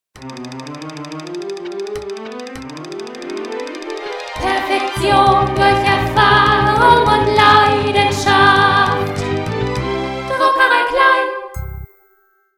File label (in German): Jingle